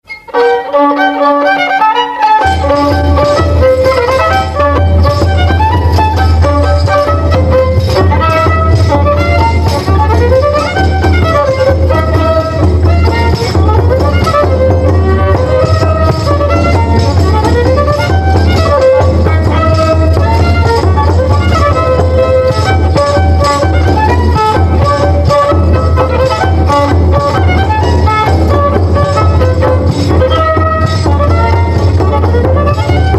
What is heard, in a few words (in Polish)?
polka